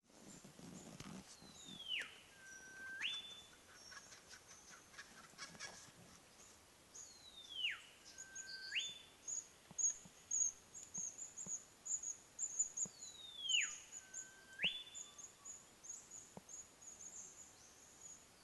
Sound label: Olive Whistler; Rennick